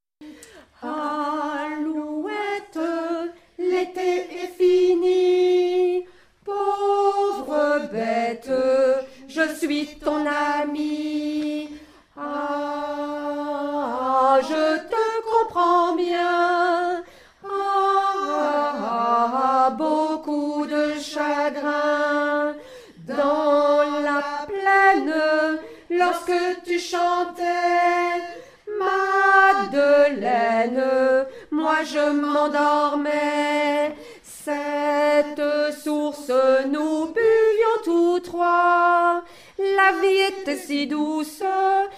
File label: alouette, Alto